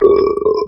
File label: burp short